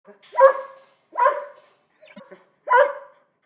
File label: Dogs A Mans Best Friend; Blastwave FX